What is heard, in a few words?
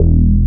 Bass One Shot E; Unit Rcrds